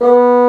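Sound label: Bassoon B0; Digital Sound Factory Virtuoso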